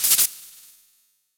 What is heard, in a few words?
Pikachu - Electrical; Super Smash Bros